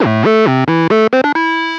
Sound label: wind up to pitch; Loops; Electronic